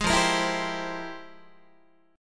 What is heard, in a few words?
F Minor Major 11th Guitar, chord; strummed; JGuitar